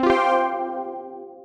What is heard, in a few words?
Correct